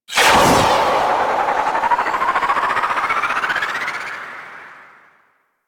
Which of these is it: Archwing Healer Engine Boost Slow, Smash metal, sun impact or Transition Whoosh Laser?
Archwing Healer Engine Boost Slow